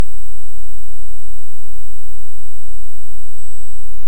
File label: Hydrophone; OneOcean